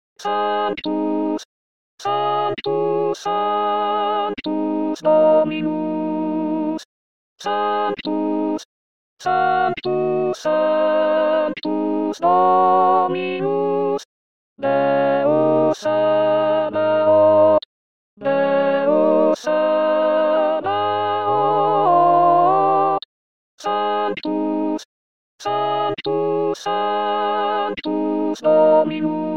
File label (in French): voix alto; sanctus